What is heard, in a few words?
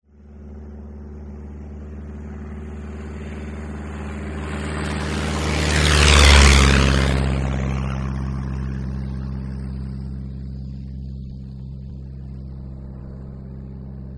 tiger moth